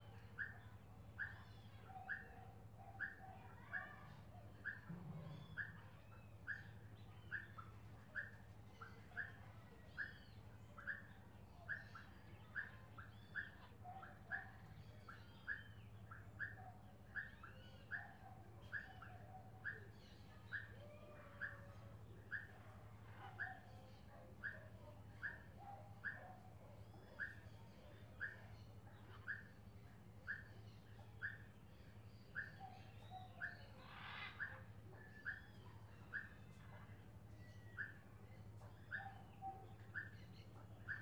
Dois sapos rio vermelho a tarde goias velho passaros, pessoas e cachorro l; animais; canais stereo; longe

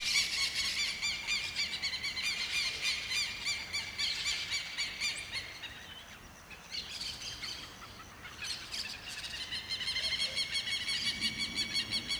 Quero queros e outros passaros no campo de manha, poucas pessoas, mosca; aves; cachorro

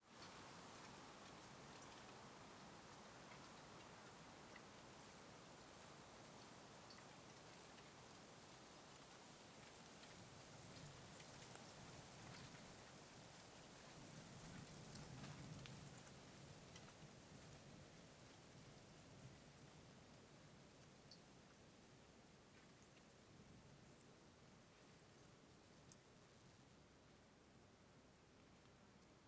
Ambiente estrada rural silenciosa vento folhas; ambiencia rural

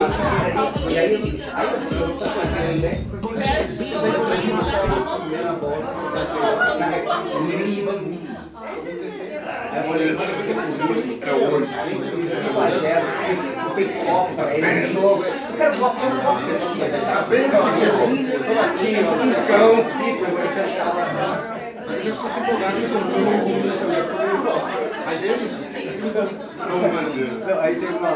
Festa de apartamento com muitas pessoas conversando e musica; multidao; Colecao Sonora do Cerrado

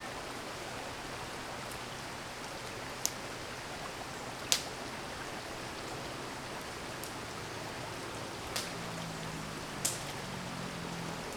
Ambiencia fogueira com cachoeira no inicio passa moto longe e walkie talki

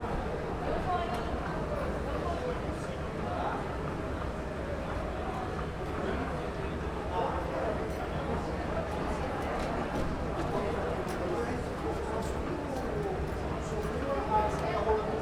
Vozerio com criancas e escada rolante; multidao

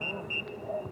Apito de Juiz de Futebol em Estadio com Muito Eco; Alto Paraiso

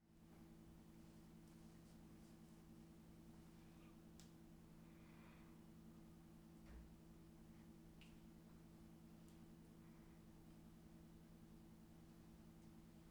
Ambiencia Sala de Estar Apartamento de Noite; ambiencia domestica

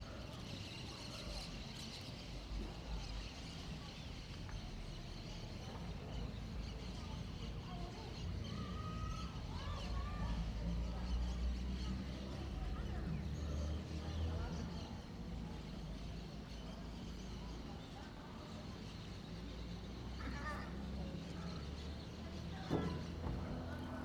Ambiencia em frente ao mercado fechado fim de tarde passaros pessoas e carro

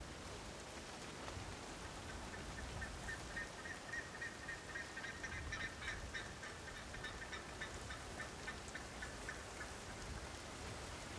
Vento em uma pequena floresta de arvores; terra ronca goias

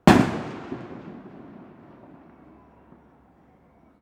Explosao um foguete em Alto Paraiso no Reveillon; Colecao Sonora do Cerrado

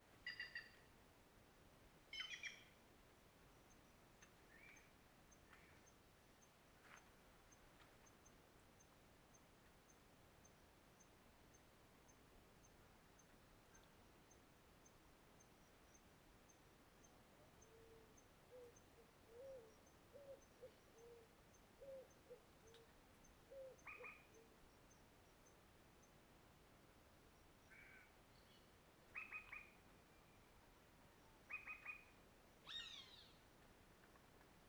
Passaros diferentes, alguns mais perto, em fim de tarde; aves; Coleção Sonora do Cerrado

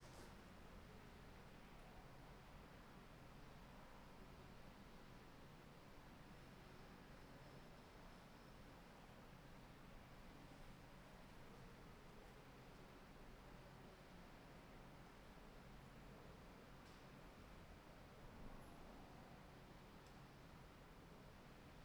Garagem Predio Subsolo; ambiencia domestica

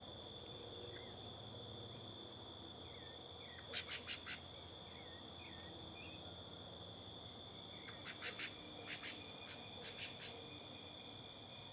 Passaro canto estridente em ambiente rural com outros passaros, grilos; aves; moscas; Coleção Sonora do Cerrado

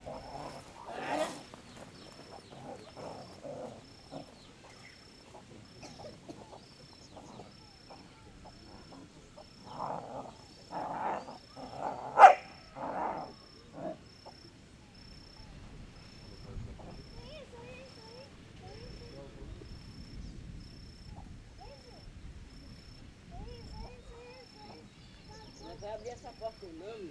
Cachorros brigando correndo pela grama com grilo, pessoas conversando; animais; vento

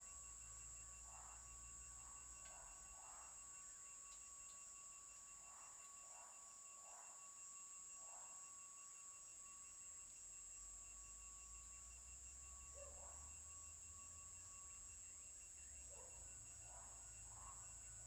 Coro de cigarras longe final da rua inicio da noite grilos, sapos; insetos

